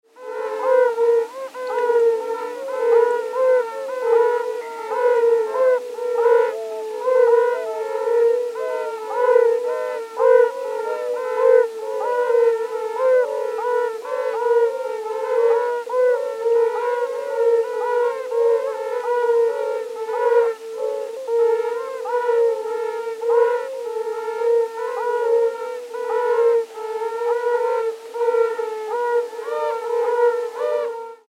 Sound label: Bombina variegata; tierstimmenarchiv